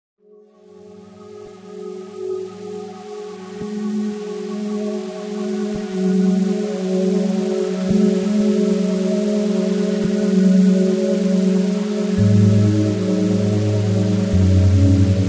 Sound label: glass glitches